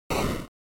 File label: Noise Phrase, NES; NES-General